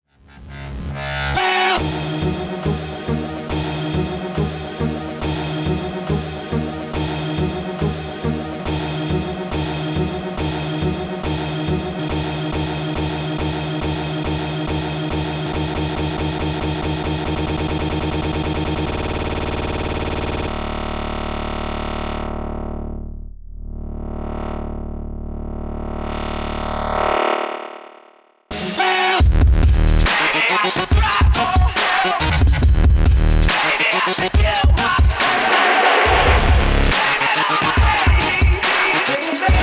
Looper Filter und Delay

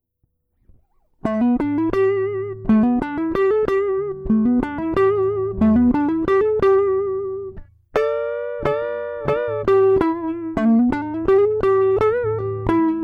E Guit Clean DI ohne Kompression

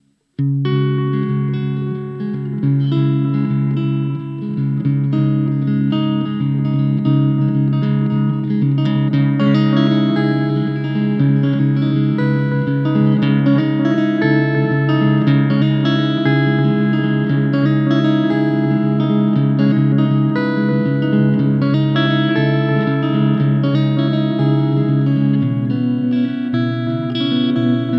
Picking e moll

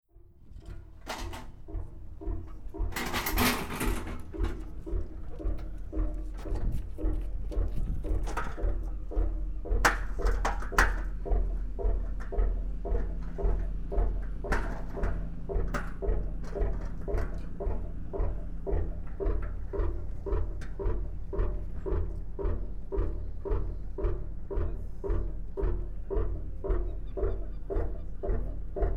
Hafen Norwegen Schiff mit einem Zylinder Atmo

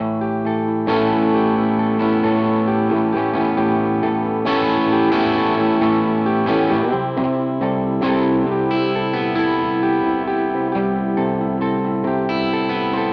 Walrus Audio ACS1 Dartford Mittel Gain Room

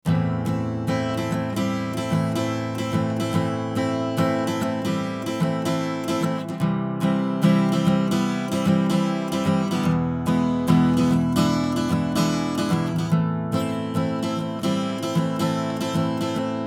Summit Audio TLA 100A Western Gitarre Attack Medium Release Medium max. Reduktion 2 dB

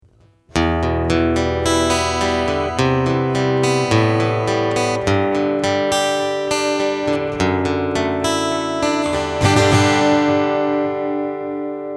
Acoustic Gig EQ Kompressor Echo; Carl Martin

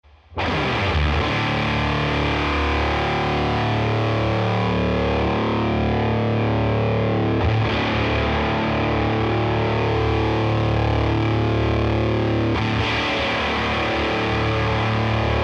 LifePedal Symm 15 Uhr Magnitude 15 Uhr Filter low to high

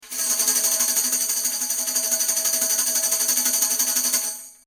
Omni Tambourin 160cm front

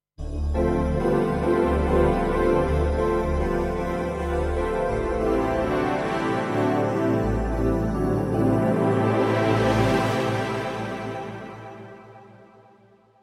Ocean Pad vorher nachher

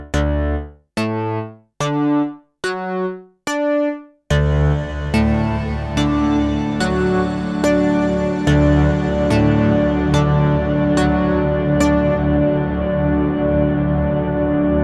Ambient mit Freeze

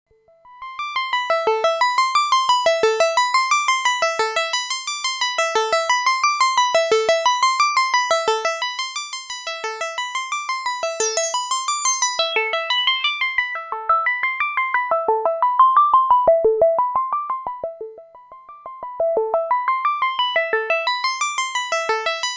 Juno 60 Arp trocken

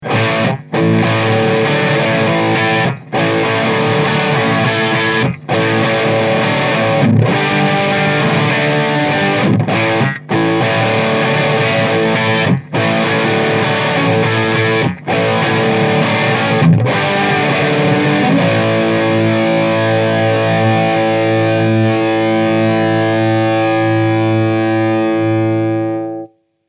Mikrofon Lead

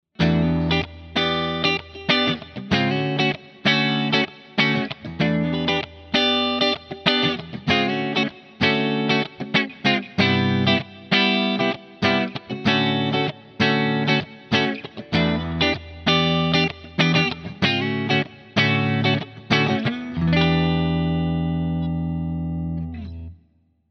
Epiphone Custom Les Paul Beide PUs clean

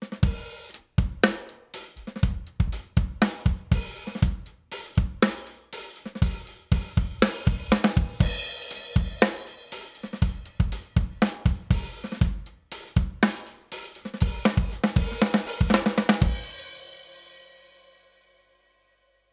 Drums Kompression 2 zu 1 SC 130 Hz bis 20 kHz

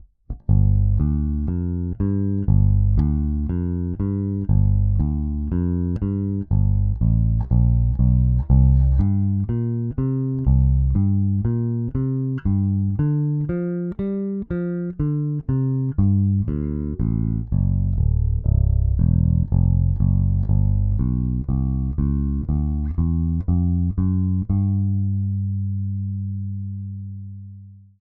AER AMP One Two Three Bass Regler boost

